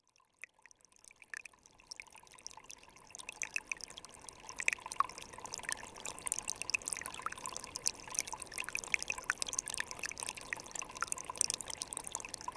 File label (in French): Ruisselet; Ruisselet 1